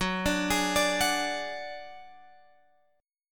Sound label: F sharp Suspended 2nd Sharp 5th, Guitar, Drop D